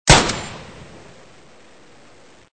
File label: pistol; Gun Mayhem 2